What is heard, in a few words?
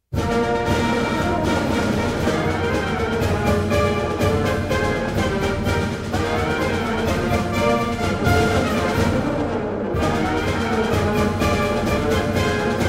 Big Cage Gallop; memories from the big top